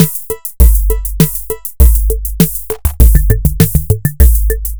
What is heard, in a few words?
dance congo drum loop